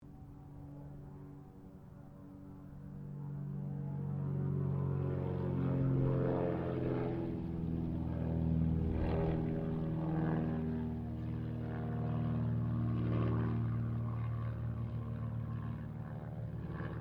AIRCRAFT BIRDDOG 0-2 TROJAN PASS; Mixed aircraft squadrons; Airplanes; Vehicles

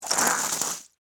Scrape Pasta Shells; Texture, Short; Scrapes (Sound Effects:Objects); BlastWave

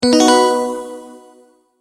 multimedia tone advance

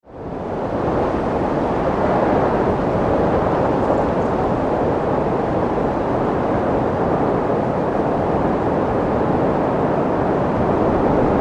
Wind, Storm

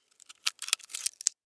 Termite, Bite, Wood, Splinter, Crack, Insect, Bug; Blastwave; Animals:Insects

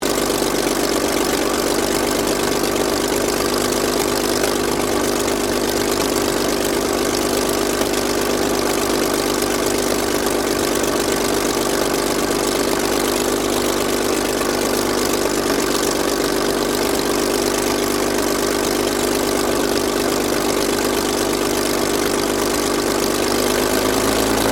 AIRCRAFT SUPERMARINE SPITFIRE MK-XVI TAXI STEREO; Airplanes

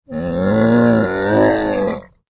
Camel Voice; Camels; Partners In Rhyme

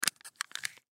Coffee Creamer; Package Empty Crumple Handle Movement Crinkle; Drink Mixing; foley; Blastwave

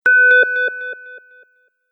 Beep Tone With Delay; Beep Sound Effects

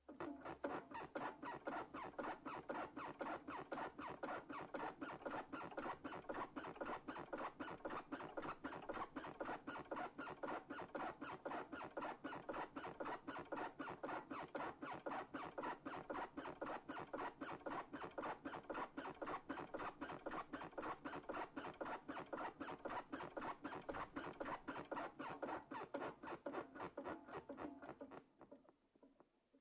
Rocking Chair Rocking

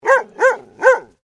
Animal Dog Dalmatian Female Bark; Various domestic dogs; Animals

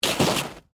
Snow Crunch; Weather; Sound Effects:Weather

